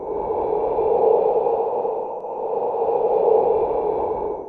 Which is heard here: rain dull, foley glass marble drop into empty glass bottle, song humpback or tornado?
tornado